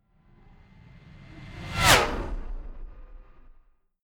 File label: fly by hover car hum high